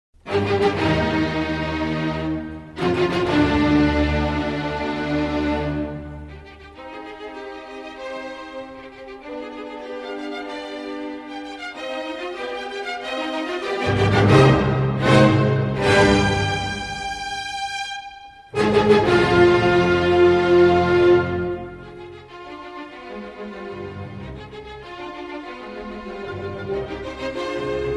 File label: Symphony, First Movement; Symphony No. 5 in C Minor, Op. 67